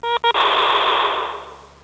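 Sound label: arcade game, parks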